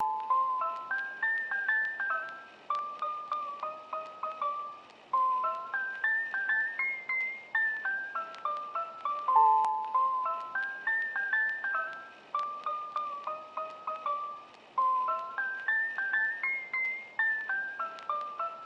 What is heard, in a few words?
Ragtime Melody; don t starve together